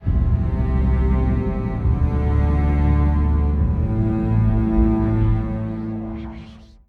Jingle Lose; Emergency 5

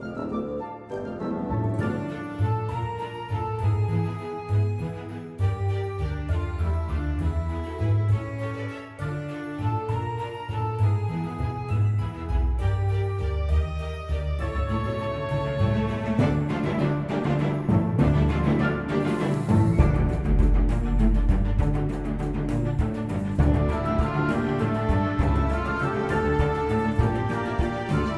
battle comical; Shadowhand; macos windows gamerip 2017

